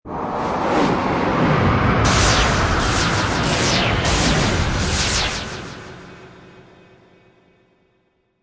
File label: Magical Conjure Barrage, Magic sound of casting spell, conjuring or wizardry; Lynne Music